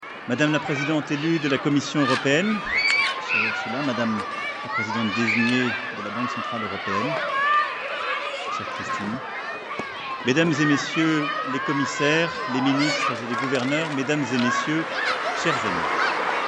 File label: School recess